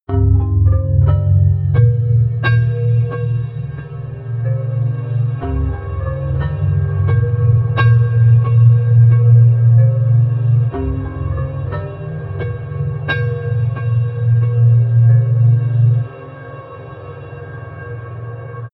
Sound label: sequence dark vibraphone